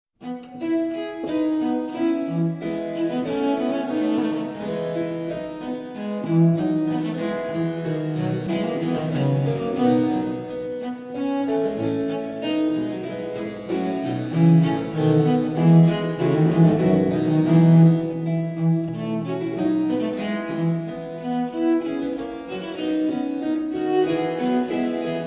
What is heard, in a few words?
pipa